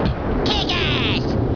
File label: kick